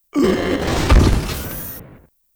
die, Mob; Skouter

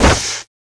Gun Cloth, Damage

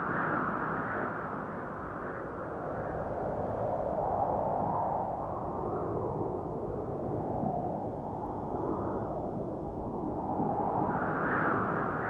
Desert Ghost Wind, ambience

